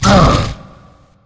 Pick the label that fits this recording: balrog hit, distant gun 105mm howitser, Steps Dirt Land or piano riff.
balrog hit